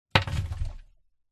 horror coffin mud dirt small stones hitting coffin box being burried internal; internal coffin pov